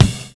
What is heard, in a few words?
CLEAR BEA; Univers Sons - Kitch 70s